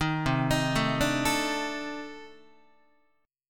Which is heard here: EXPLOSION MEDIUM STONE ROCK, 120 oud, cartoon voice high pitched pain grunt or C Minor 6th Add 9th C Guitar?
C Minor 6th Add 9th C Guitar